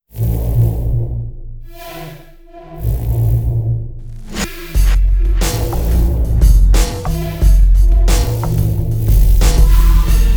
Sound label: Cinematic